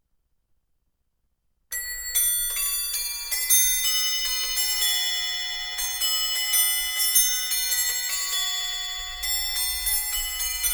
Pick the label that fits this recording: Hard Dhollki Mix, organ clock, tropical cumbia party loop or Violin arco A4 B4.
organ clock